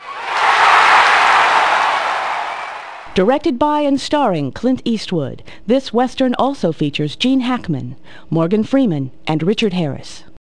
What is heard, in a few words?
CHEER